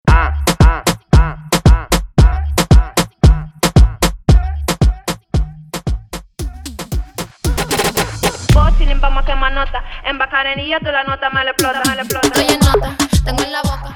Intro Break 114 Bpm

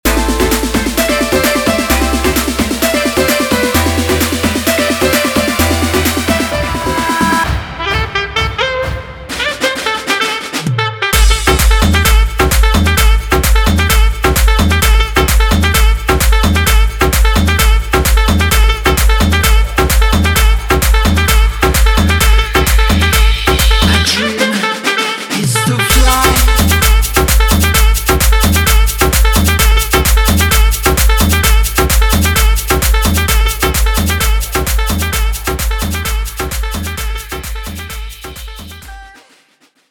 guaracha remix 130 bpm; Prodeejay Remix

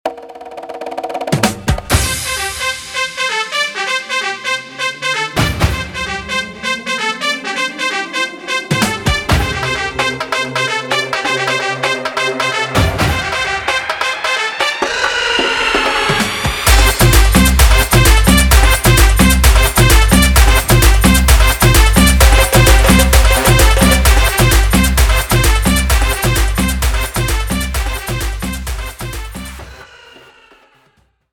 batucada intro outro 130 bpm

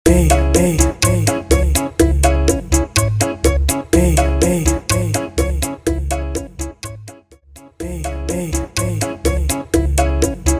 Intro Outro 124bpm

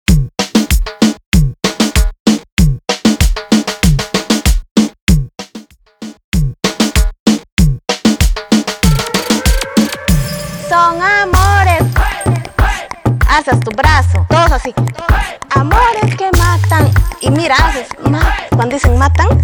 Reggaeton 96 BPM

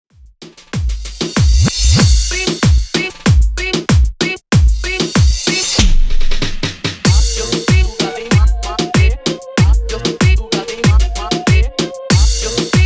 Remix Intro Outro 95 Bpm; Prodeejay Remix

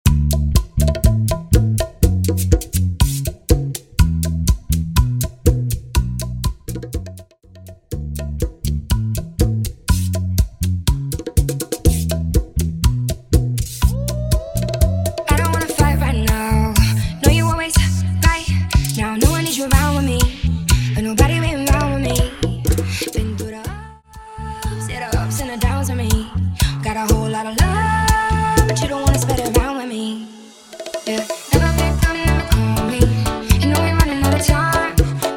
Bachata Project 122 BPM; bootleg bachata remix

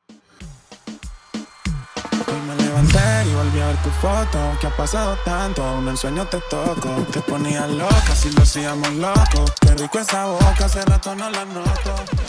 Intro 96BPM

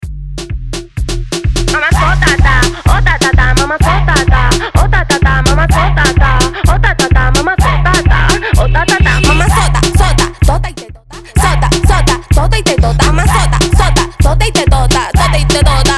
intro directo outro 127 bpm

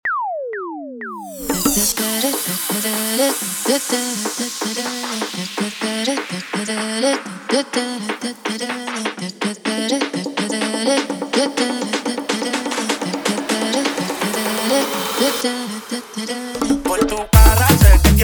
intro outro 125 bpm

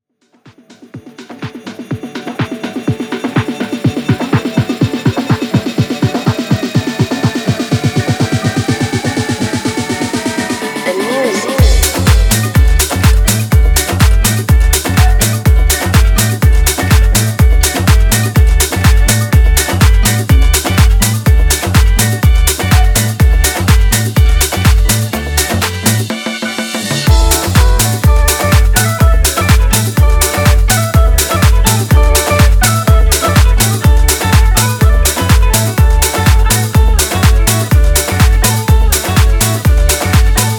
tech remix 124 bpm

